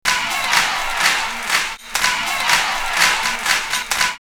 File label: Rave Clappin' 122, drumloops Techno&House; X-Static Goldmine